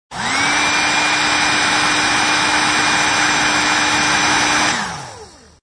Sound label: Heat Gun Strong Long, Heat gun, long shot, high power; Also works well as a food mixer sound, drinks mixer or mixmaster sound; Lynne Music